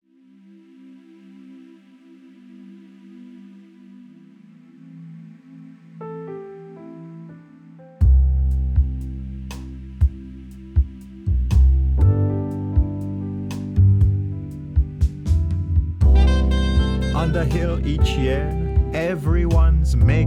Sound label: remixed